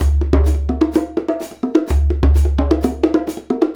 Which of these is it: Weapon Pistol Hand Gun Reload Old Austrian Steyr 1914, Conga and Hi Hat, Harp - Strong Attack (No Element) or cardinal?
Conga and Hi Hat